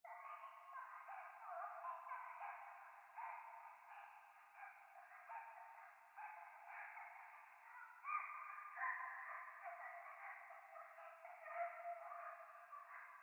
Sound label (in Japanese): 廃墟 可愛い喘ぎ 山奥夜